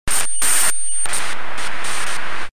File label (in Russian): pomehi sensornogo kompjutera